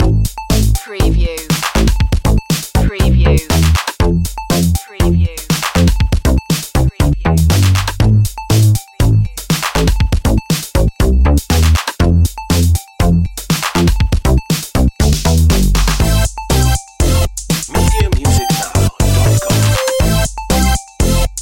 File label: video game fun long looping